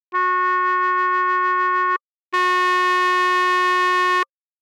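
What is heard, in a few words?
hard clip; woodwinds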